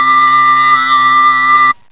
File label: male solo1 (tuva); Octamed 6; walkabout; far east